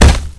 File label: machete hit